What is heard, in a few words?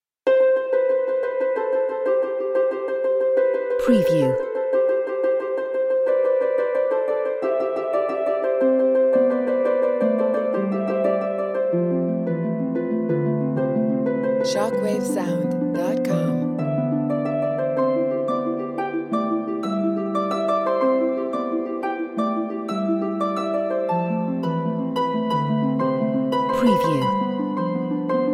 harp